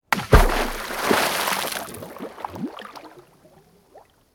chto to brosili v vodu i ono utonulo

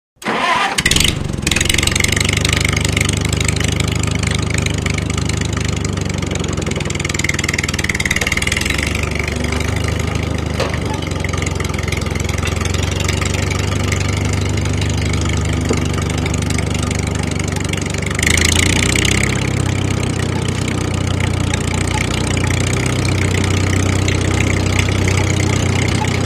traktor zavoditsya ozhidaet dvizhetsya

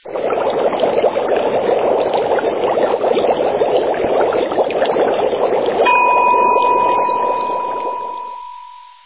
kipit voda i zvonok tajmera

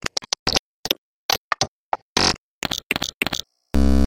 kliki rezkij blizkij

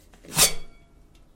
metall otkritie kostryuli mgnovennij

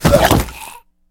vnezapnij silnij pristup rvoti

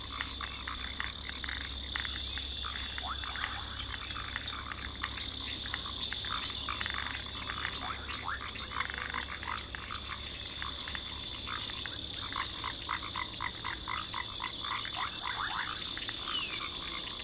raznoobraznoe vechernee kvakanie lyagushek na ozere

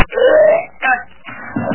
rvotnij poziv

